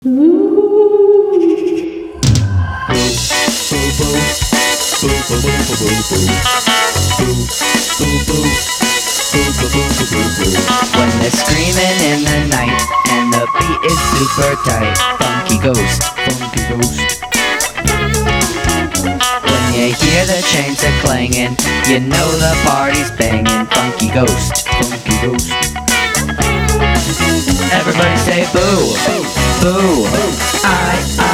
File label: Funky Ghost